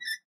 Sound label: parrot; Minetest; VoxeLibre; mobs mc